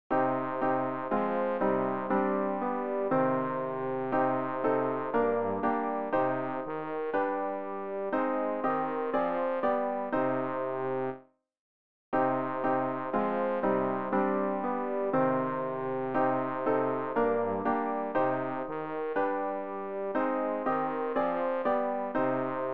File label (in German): bass; Ref. Gesangbuch; RG 661: Allgenugsam Wesen